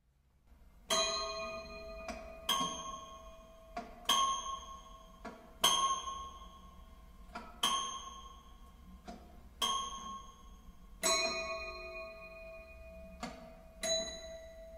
Toy Piano Ensemble

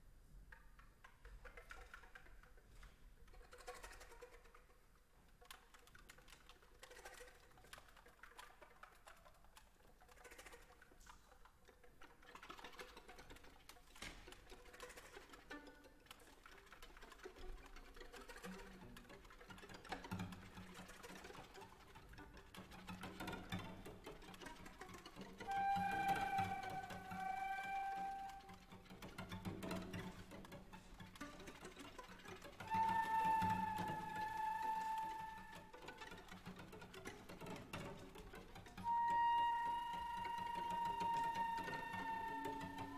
White Noise

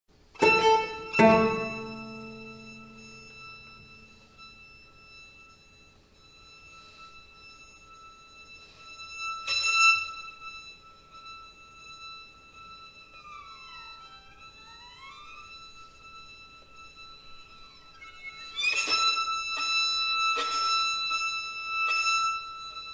string quartet

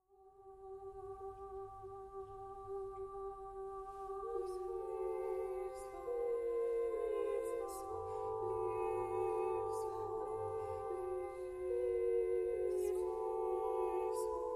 SSAA

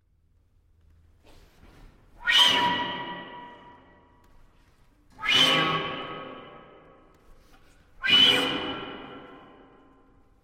Soundscape, ensemble; Alexander Khubeev

